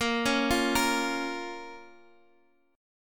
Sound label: A sharp Minor, down; arpeggiated